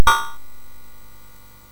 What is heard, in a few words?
Fast Sfx, MOS6581R3; hvsc